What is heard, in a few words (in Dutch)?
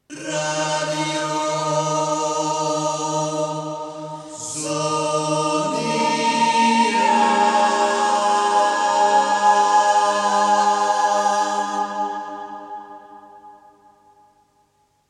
Akoustisch kerksfeer, jingles; Radio Zoniën